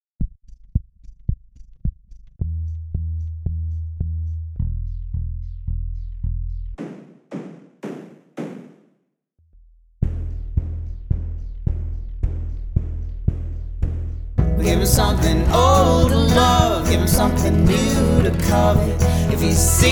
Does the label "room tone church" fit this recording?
No